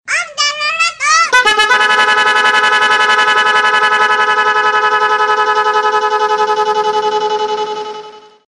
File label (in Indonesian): nada dering om telolet om; Om Telolet Om (Versi 4)